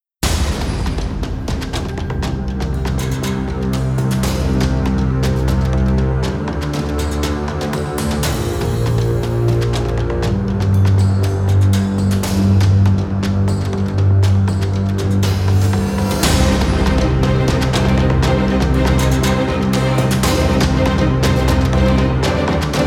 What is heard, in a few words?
Dramatic evolution